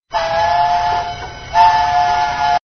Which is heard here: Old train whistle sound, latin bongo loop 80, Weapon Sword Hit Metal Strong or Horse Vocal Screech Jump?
Old train whistle sound